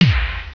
Kick

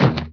Dragon Ball Impact; worms

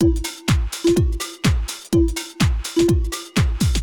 drum loop house 125; eJay

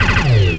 fan fire; thexder

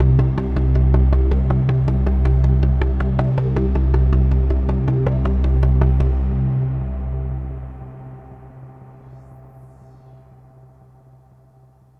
AMBNT 160; Ambient

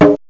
framedrum1, arabic; Octamed 6; walkabout

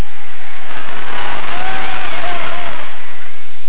CROWD; Jason Aller Floppy Collection

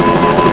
drone; RETURN FIRE

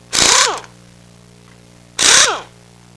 AIR POWE, TOOLS; Easy Personal Web Page

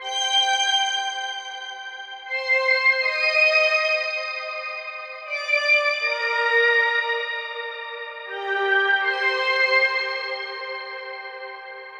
SYNTHPAD AMBNT 160 C; eJay; Ambient; Sphere

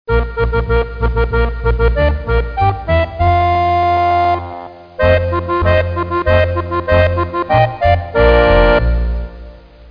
Anthem

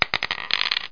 coin; ezsound2

